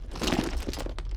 helmet, elevator helmet; Doom

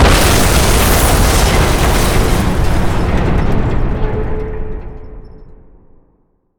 turret explo; Quake 4 Alpha; id software

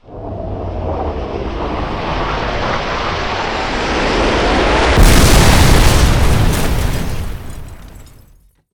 big gun hit; Quake 4 Alpha

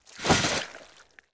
pinky drags body; DoomRTX

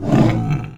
breath, strogg; Quake 4 Alpha; strogg tik

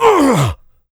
pain large, player; Doom

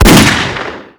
Pistol shot short; Doom

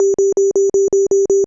beep; DoomRTX

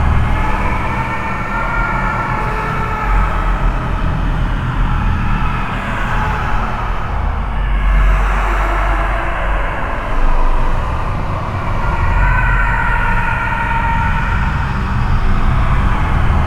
scream loop, ambience; Quake 4 Alpha

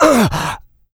pain light, player; DoomRTX; medium

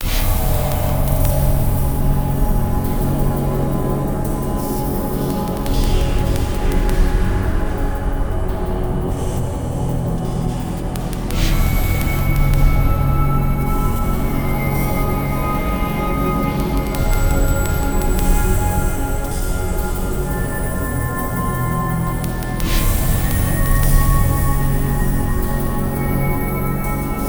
music; Quake 4 Alpha; cryo intro

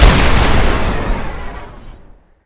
shockwave, strogg; Quake 4 Alpha; id software; valkaryne

